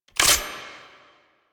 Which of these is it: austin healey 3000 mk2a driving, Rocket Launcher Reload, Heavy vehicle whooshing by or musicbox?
Rocket Launcher Reload